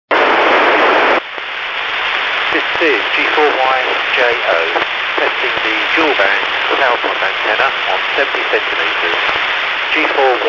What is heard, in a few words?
Dual Band Flowerpot Antenna on 433.575MHz; Now with the sleeve fitted